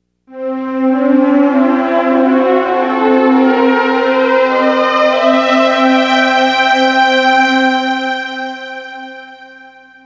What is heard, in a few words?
Dur I, modes